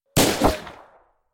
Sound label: shots fired